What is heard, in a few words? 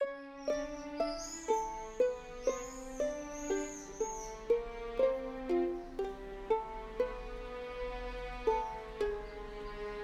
Valse Melody